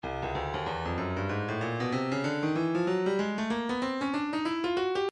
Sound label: breakbeat midi, 95 bpm; urbania c; Stylus Breakbeat Midi Files